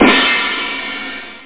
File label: techno; OctaMED SoundStudio V1; drums snare